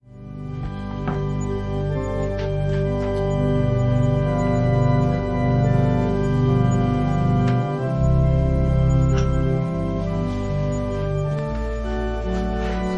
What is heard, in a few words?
Organ prelude